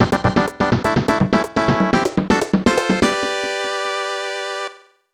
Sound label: Level Cleared; Mario Mania; SNES